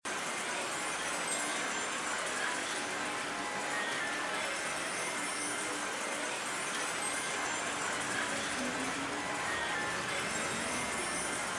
BGM Intro; Wealth Gods2

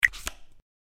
gamble Btn Deal Hold; slots; House Of Luck

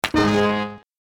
Lose; FinWin

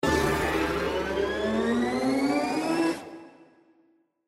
Anticipation Jackpot; Lotus Garden

